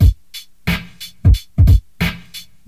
90 bpm drum groove f sharp key, kick tuned to the F# note. Loudest frequency: 858Hz